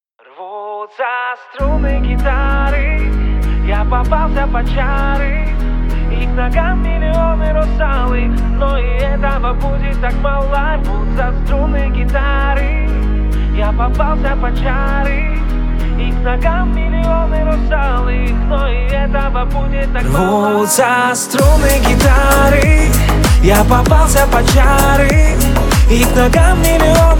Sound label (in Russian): struny gitary